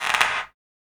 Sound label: CLAP FX